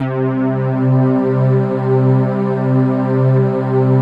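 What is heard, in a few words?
PAD, atmospheric pads; Best Service; XX Large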